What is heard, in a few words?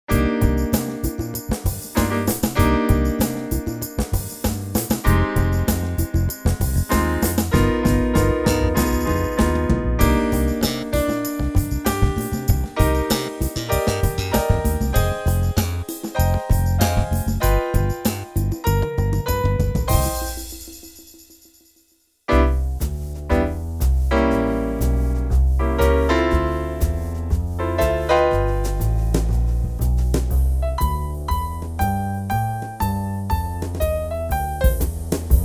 Bass Splits Large